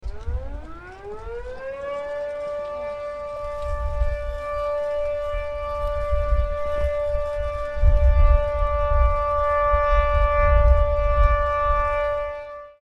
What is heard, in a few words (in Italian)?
sirene; Fukushima 12.30